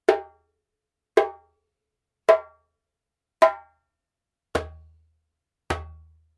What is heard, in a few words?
djembe